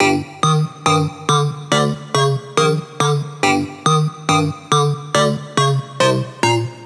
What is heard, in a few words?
Riddim Synth Loop G Tick Tock Goes the Glock (140); Ghosthack; Riddim Essentials 2020